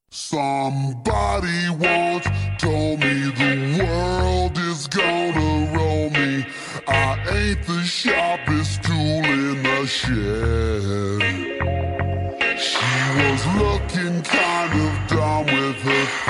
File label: chase Music; Shrender-Man